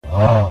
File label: aah snoring